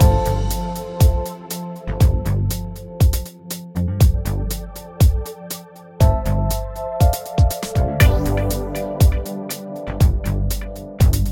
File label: main music; dazzle